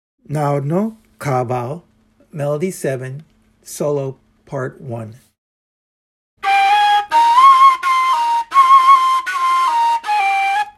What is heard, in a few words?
Narodno kaval melody solo